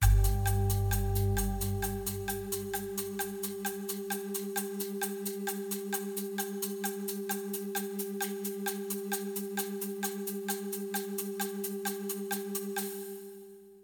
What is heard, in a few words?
sting; Corel CD Power Pak: Sound Clips